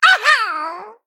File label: Male Imp Death